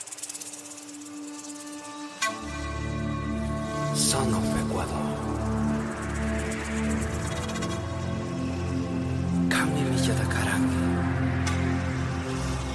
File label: i phone x native american ringtone